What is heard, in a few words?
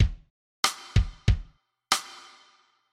Drum